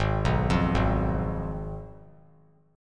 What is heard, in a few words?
Ab Minor 6th Ab, down, 3C, 3F, 3A, 3D; Abm6 arpeggiated; JGuitar